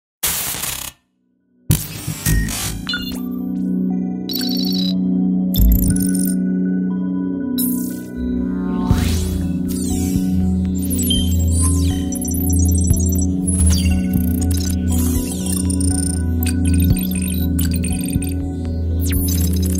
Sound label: HUD menu